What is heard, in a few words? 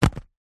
wallet leather drop on hard surface